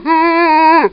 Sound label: zombie voice idle